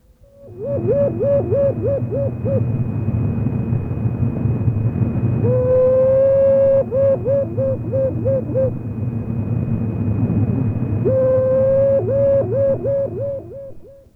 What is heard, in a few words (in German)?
Tüten